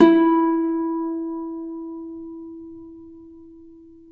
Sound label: Celtic Harp, Plucked Strings